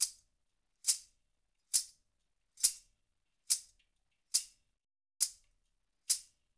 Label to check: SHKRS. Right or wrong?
Right